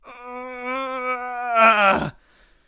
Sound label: agony; Escape from Tarkov